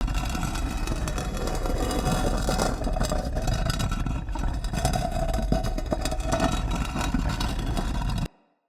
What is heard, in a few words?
Weighted Stone Plate Switch Loop